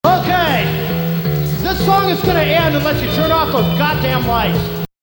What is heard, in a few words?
jello screams